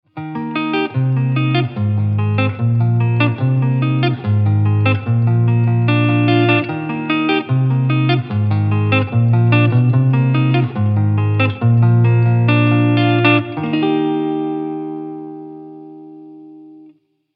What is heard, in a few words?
chime